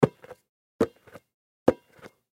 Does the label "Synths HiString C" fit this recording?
No